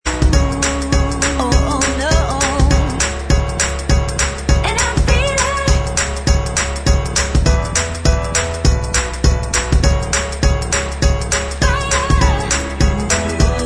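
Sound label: backing vocals track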